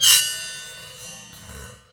Prepared Drums

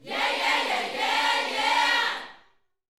Vocal, Gospel, PHRASE; Spectrasonics

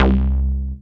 DM BAS, Dance Mega Synth; Best Service; 90 s Sample CDs

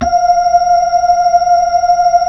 B-3 Hollow; Keyboards of The 60's and 70's

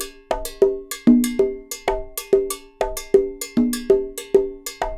Percussionworks

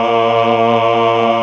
TRON MALES, Vintage Instrmt; E-MU Formula 4000 Series Vol. 5; Protozoa

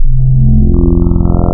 ORGAN, wavetables Classic Synths

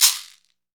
CAXECERE, Latin Shakers

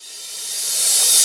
Reverse Cym, Cymbals

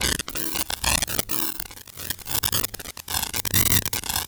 STATIC; Distored Reality

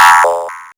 ARP2600; AMG - Vince Clarke Lucky Bastard

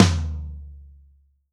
TOM 3H L, DRUMKIT

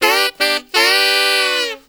SAX, W.AFR.SAX; Spectrasonics; Heart of Africa

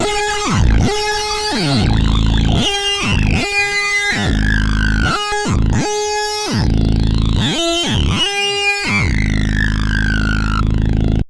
Yamaha CS1x filtered riffs; FM 57 - The Ultimate Sample Collection (1997)

